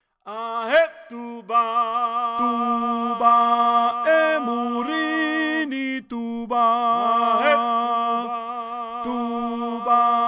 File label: tenor